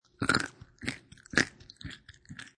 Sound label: Nut Crunch Chew, Chewing a nut.; Crunchy Nut Chew; Eating (Sound Effects:Human)